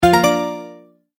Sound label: multimedia tone start; Sound Design; McKinney Sound